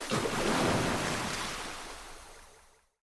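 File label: strong stream starts, geyser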